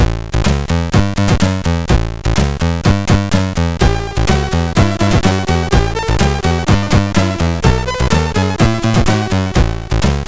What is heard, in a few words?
Rock and Roll CSG8580R5; Mermaid